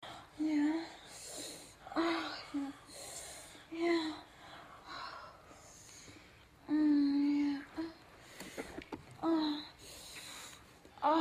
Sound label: sex, moans; The Witcher Hunt